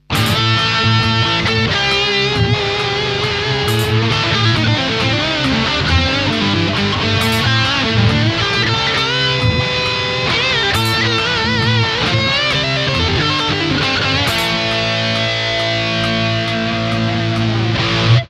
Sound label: dist bridge, gibson pick